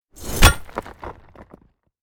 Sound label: Pickaxe launch and hit